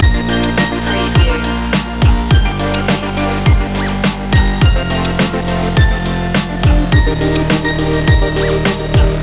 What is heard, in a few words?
joyful jumps loop